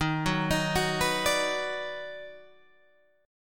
Eb Minor Major 7th Sharp 5th Eb down Guitar Open G, chord